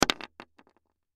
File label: Ice Drop Wood; Food; Blastwave